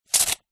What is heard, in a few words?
industrial tape measure metal retract into plastic reel